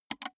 gaming mouse left click single fast; maschinen; buero

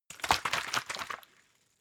bottle water shake short; berufe

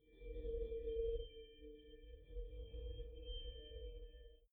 space scary forest chirp call short; atmosphaeren umwelt; weltraum

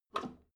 drain valve close regular, badezimmer; haus garten

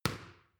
boxing paw hit medium; sport freizeit; boxen

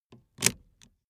car seatbelt unfasten hard

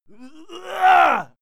male fight scream stutter; soundpool

